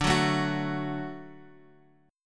D Major D down Guitar Open F; D strummed; JGuitar

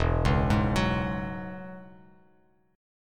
E 6th Add 9th E down Bass Standard

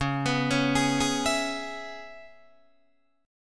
Db Minor 7th Db down Guitar DADGAD; arpeggiated

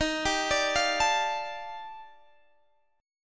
Eb Major Sharp 11th, down, Guitar, Drop D

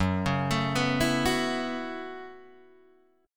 Gb Minor 11th Gb Guitar Open D; down; chord shape

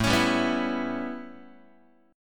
A 9th, down, Guitar; strummed; JGuitar

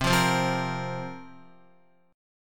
C 7th Suspended 4th, Guitar, chord

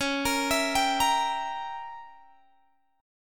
Db Diminished 7th, Guitar, Open G

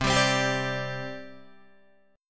C Suspended 2nd, C, down, Guitar, Open D

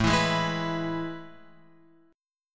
A Major 7th Suspended 2nd, chord; AM7sus2 Chord {5 7 6 X 5 7} strummed

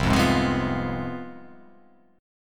C Major 7th Suspended 4th Sharp 5th, down

